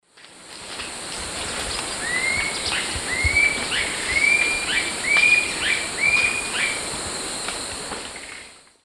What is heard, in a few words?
Galbula chalcothorax, call; S. America; Eastern Lowlands